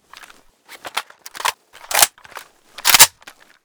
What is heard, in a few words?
scar reload empty